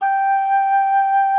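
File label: G5, oboe d'amore; Xsample VOL-7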